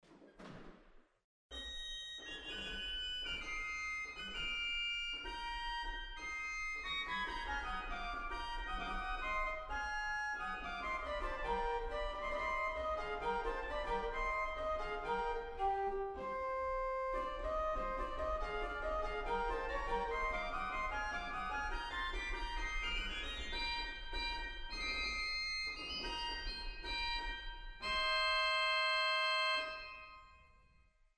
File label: Sesqvialtera; waltershausen